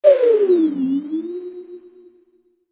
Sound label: Power Down